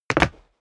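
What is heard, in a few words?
step dress boots wood parquet jump, footsteps; schritte; menschen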